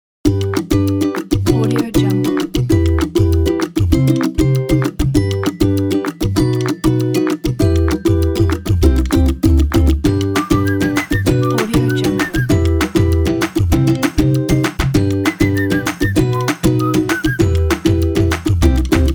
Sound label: a happy